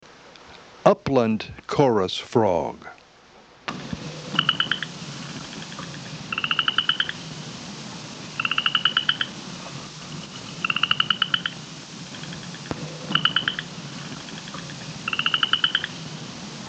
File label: Upland chorus frog (Pseudacris triseriata); Family Hylidae - Treefrogs